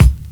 KIT, Dance Mega Drums, HIP; Best Service

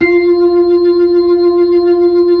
B-3 Blues; Keyboards of The 60's and 70's

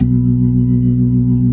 B-3 Jazz, keyboards; Keyboards of The 60's and 70's

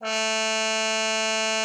CUP MUTE, A3; Xsample VOL-3

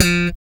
6 STR FUNK; Spectrasonics; Bass Legends

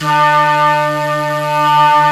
Flute, Singin' Flute

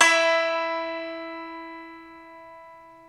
SU GZHENG, Earth Tones

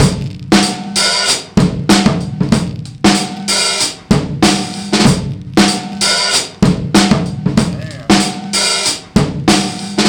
95 bpm, Breaks; THE SKIP; POTBreaks

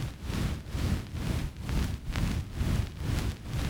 Rythmic Noise 130BPM

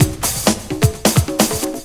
break in the conga; Zero-G Deep House; 130bpm

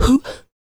FEM PERC, Vocal Jazz FX

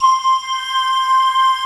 glockenflute, Glocken Bell; Optical Media International; Sonic Images Library

